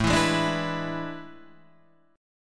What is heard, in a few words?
A Major 7th Suspended 2nd Suspended 4th; AM7sus2sus4 Chord {5 7 6 7 5 7} strummed